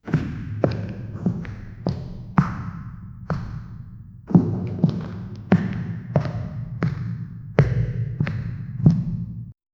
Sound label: create a sound that gives, space station